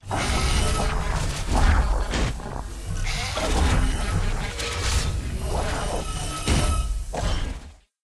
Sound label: idle, monster